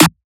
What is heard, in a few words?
Sizzle Snare